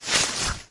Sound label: dry; DonkeysAndDroids.Godot